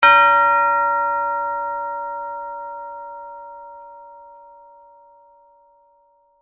Tubular Bell Hit; Bell Sound Effects; Bells (Sound Effects:Musical); BlastWave

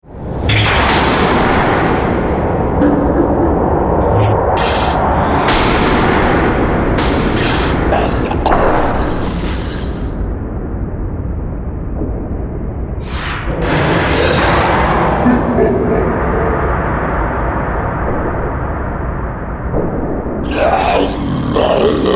Monster Battlescape; Monster Sound Effects

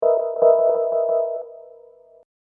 Hip Hop Dark Rhodes Licks 90 Bpm Straight E; Keyboard Loops; Lynne Music

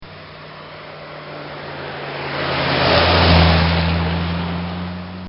Car Driving By; Cars; Vehicles; Bobby Cole

